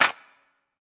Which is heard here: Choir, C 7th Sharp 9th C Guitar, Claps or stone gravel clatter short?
Claps